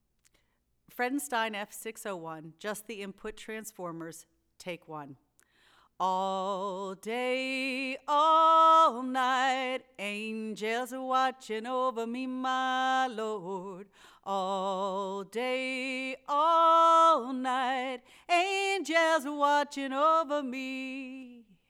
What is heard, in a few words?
Vox; mic pre shootout